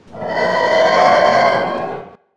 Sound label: Dinosaur